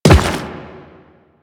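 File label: step on rock, footsteps; Warframe; The New War; Kahl; Quests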